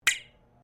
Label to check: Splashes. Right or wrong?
Wrong